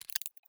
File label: ui time control